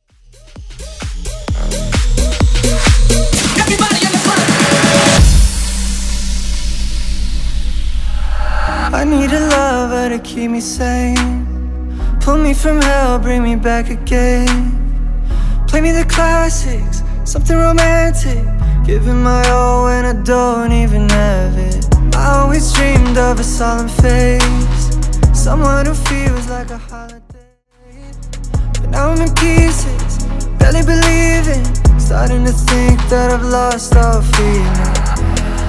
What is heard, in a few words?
Bootleg Transition